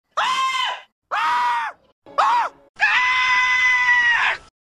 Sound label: Scream; Homer Simpson